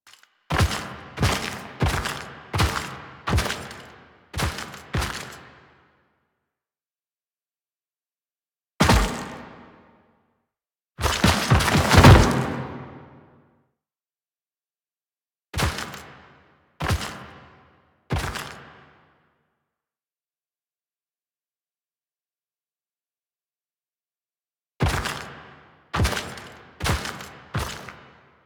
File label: Camp Foley Steps; Warframe; The New War; Grineer Eidolon Camp